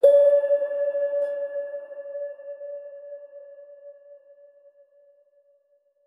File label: ding ta dum, ding